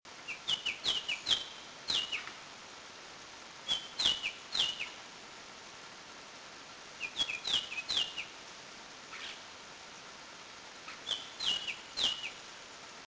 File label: Icterus leucopteryx leucopteryx; West Indian